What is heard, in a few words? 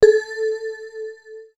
Swirls Vox Bell, pads; X-Static Goldmine